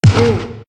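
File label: giant wolf leader damage up, monster; Senillinea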